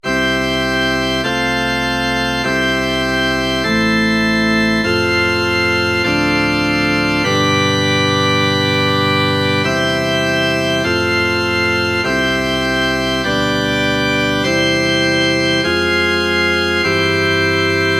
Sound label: chorale without nct